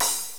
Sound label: cymb; hofclient